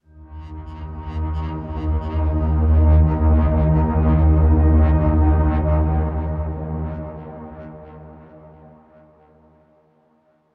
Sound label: 89BPM; DalekStealthEnd; Doctor Who: The Edge of Time